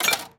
portable slot coin

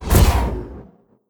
portal emerge

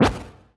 boss move; horizontalrunner

